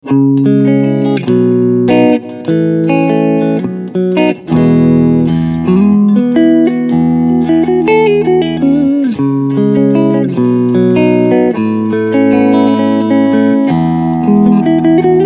Beide PUs SC Cleanpicking

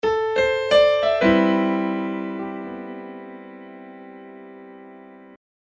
Klangbeispiel Piano 90BPM Elastik fast

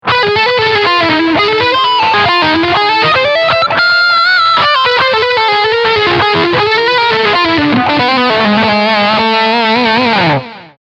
Leadsound Steg Humbucker

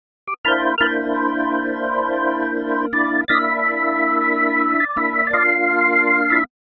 Orgel clean

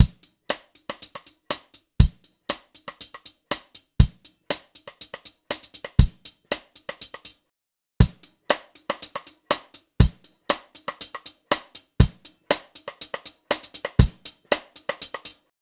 DRUMS eqing Beispiel mit Sättigung

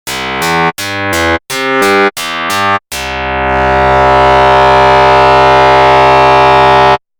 Sequential Pro 3 Karplus Strong Synthese mit Tuned Feedback

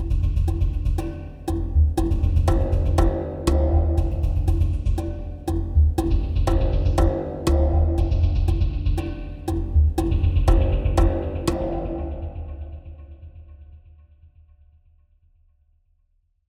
BOHEMIAN Velocity Stufen

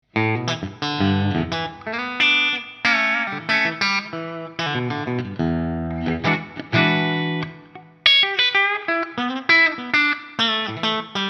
steg clean

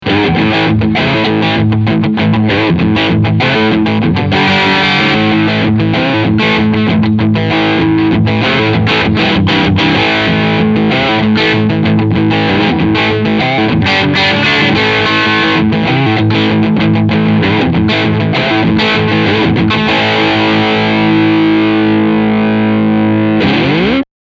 Steg PU Overdrive

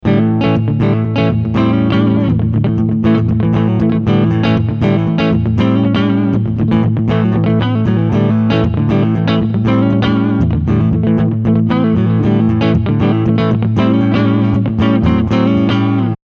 Frontpickup Clean

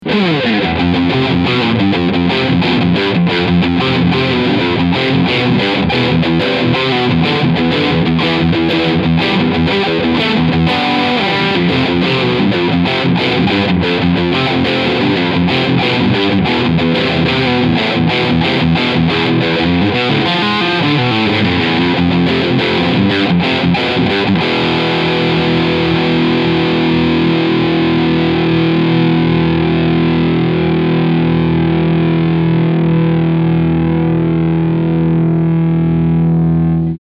Riffsound Steg Pickup; Ibanez ATZ100 SBT

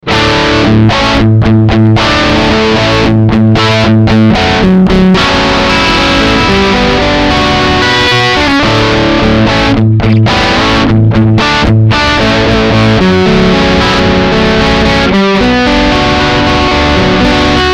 Rock Linesignal

